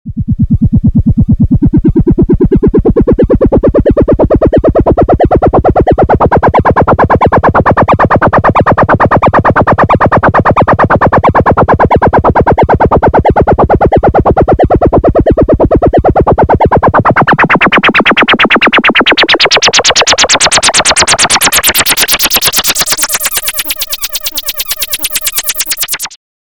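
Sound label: ARP Avatar VCF FM Amount via WHEEL Resonance only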